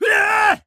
Male Grunt Shout; Screams Shouts 2; soundbits

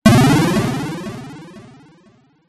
multimedia game sound positive action tone

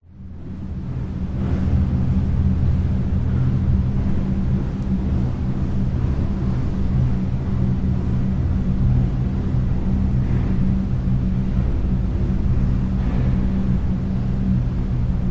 school roomtone air filter hum staircase

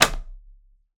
Notebook Dropped Carpet

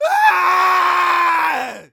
Humans Male Scream

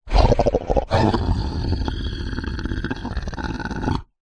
horror monster creature growl snarl; zapsplat

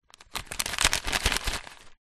food microwave popcorn packet paper shake

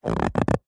rubber short rub creak

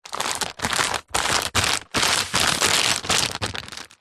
wrapping paper movement roll up

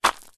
foley footstep single stony gravel road; zapsplat

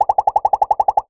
cartoon pops fire quick succession

